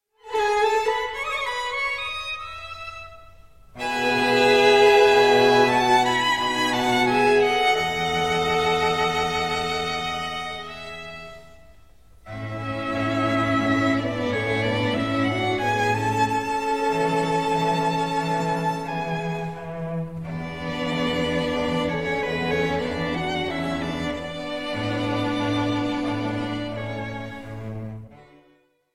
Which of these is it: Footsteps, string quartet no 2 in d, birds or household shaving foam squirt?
string quartet no 2 in d